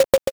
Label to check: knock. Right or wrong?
Right